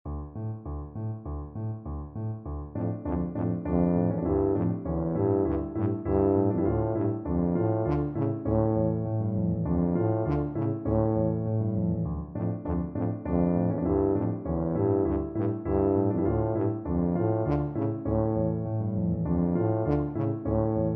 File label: danses des cygnets, tuba